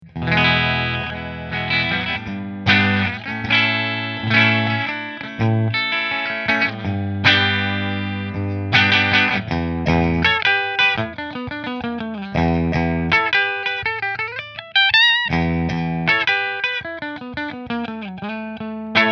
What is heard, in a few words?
Warrior Satin Position 5 Fender; Warrior Guitars Dran Michael Natural Satin